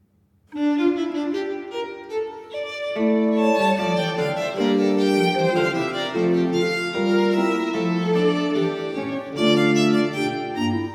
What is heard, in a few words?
Sonate 2 in E minor - 2. Vivace; Archangelo Corelli; Arcangelo Corelli; Corelli - Complete Works; Klassika